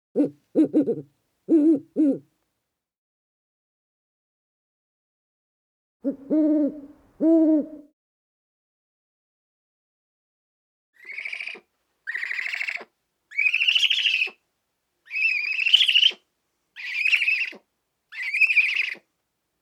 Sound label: Bird, Owl Calling Happy Sad